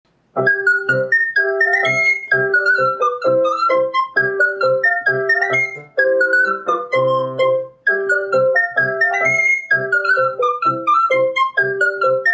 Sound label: Tambourine